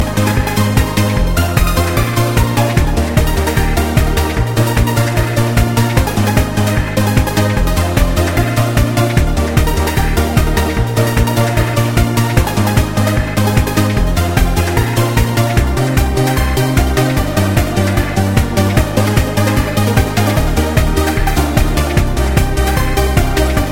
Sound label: Dance Floor